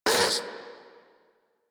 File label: Catbrow Combat Vocal Pain; Warframe